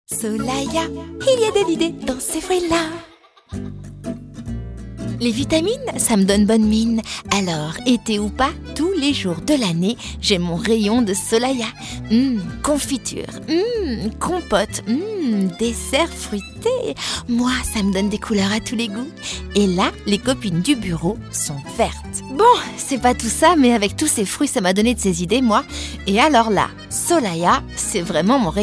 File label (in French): fraiche gourmande chantee; Solaia